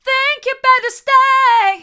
think you better stay hard, vocal

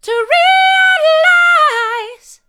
to realise hard, vocal

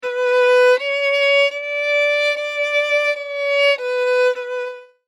original Violin

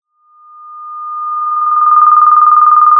pop, Sound of a phone ringing

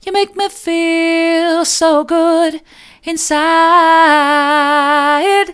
mid, vocal

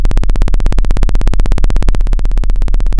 Sound of a plane taking off

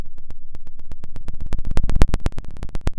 pop, Sound of a fire crackling

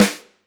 Lud Rim, drum

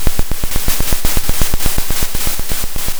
Sound of a drum roll

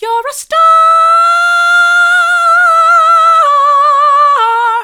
vocal

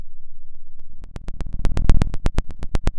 Sound of a fire crackling

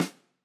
Snr, drum

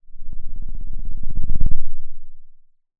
pop

